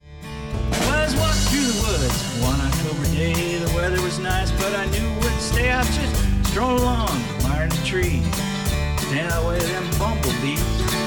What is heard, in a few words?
talking chipmunk blues